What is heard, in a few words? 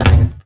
ollie metal, skater; Tony Hawk's Project 8; XBOX